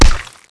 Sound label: bomb drop; Boss Megalodon